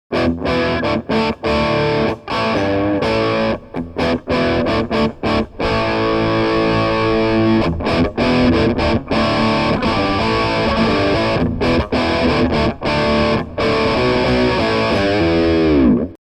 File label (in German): Halbes Gain Chords